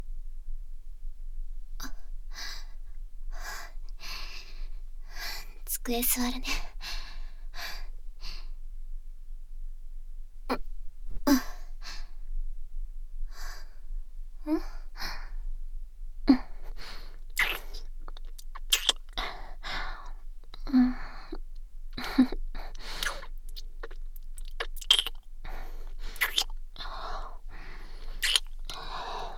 耳舐め SEあり, ASMR; 机の上で甘々正常位と耳舐め潮吹き。ご褒美連続中出し

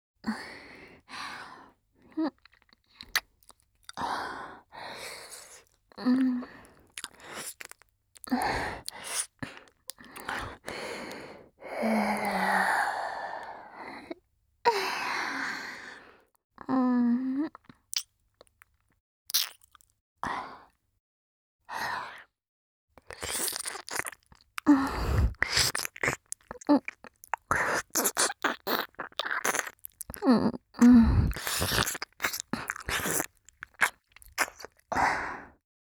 耳舐めのみ 左耳; 遊郭で見つけた関西弁の天使おまけ

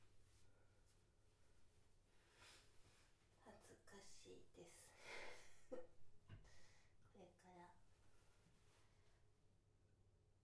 オシッコしちゃいますッ！はずかしいですぅぅぅ

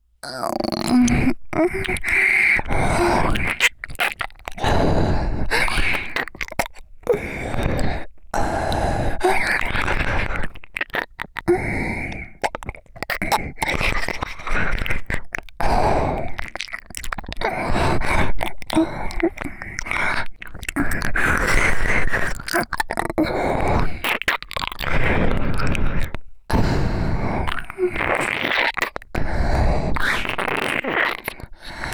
耳舐め, ASMR; チン嗅ぎと裏筋舐め上げ; ギャルフェラッ!!学校は援交ギャル風俗店ッ!!

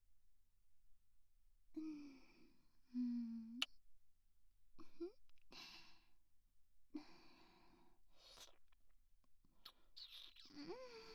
キス; 【マゾハーレム】

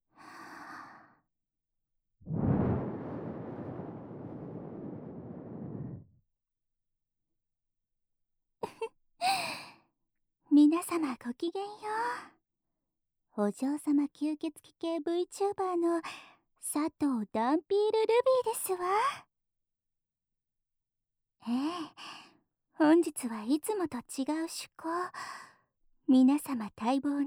オナニー実演 ASMR 配信

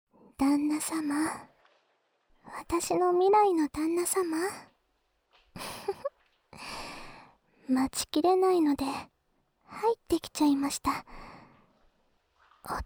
耳舐め; 授業中にこっそりねっとり耳舐め＆フェラ…

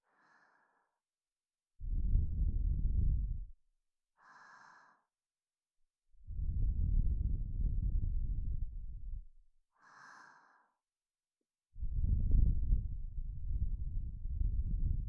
耳ふーはー, ASMR; 耳ふーはートラック; 聖なる夜の機械仕掛けな贈り物「人肌恋しい夜に耳かきと添い寝は如何ですか?」

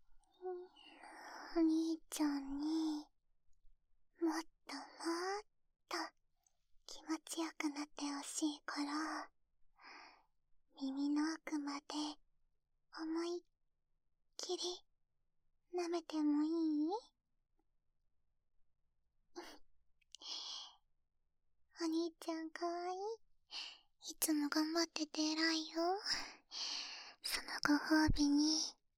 ふか～い耳舐め; 【3大特典×耳舐め】妹の妹によるお兄ちゃんのための耳舐め

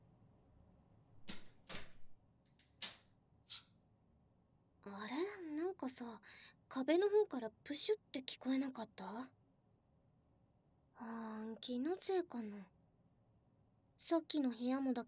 耳舐め; 耳舐め一般常識の部屋; シコ解き脱出ゲーム1

